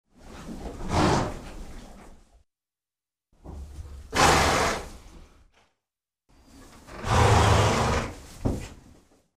Horse snorting

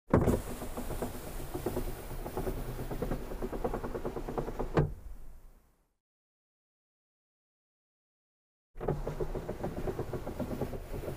Car glass lowered, raised manually

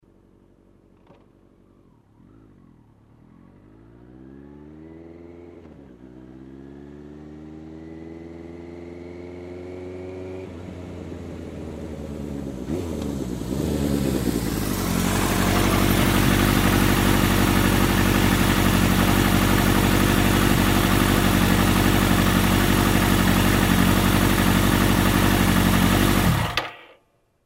Motorcycle approaching, turning off

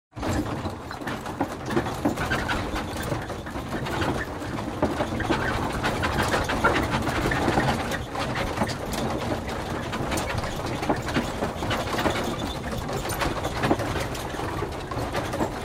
Horse cart movement

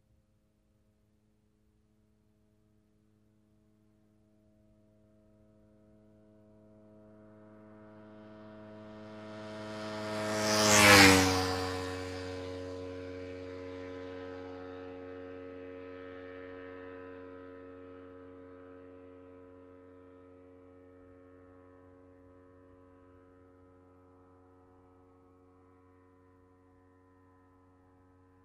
Motorcycle passes by quickly

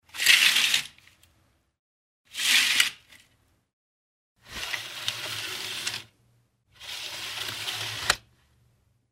Curtains open and close; windows

